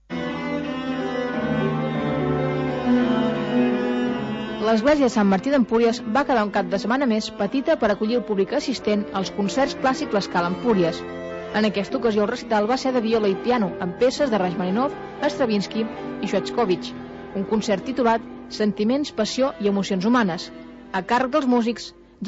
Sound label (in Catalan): un concert de viola i piano als clàssics